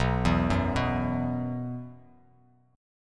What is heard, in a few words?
B Suspended 4th B, chord; Bsus4 arpeggiated; JGuitar